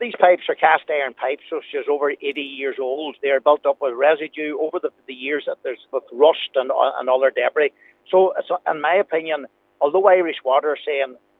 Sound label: Pipes; Buncrana